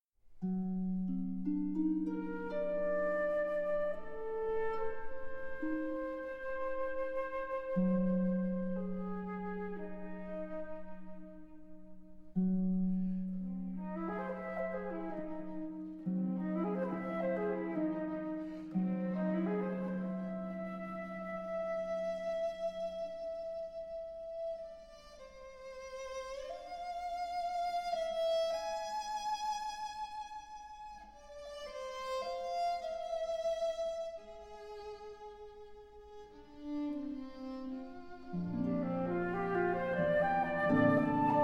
Sonata for Flute, Viola and Harp, Pastorale. Lento, dolce; Debussy; C 137, L. 129; rubato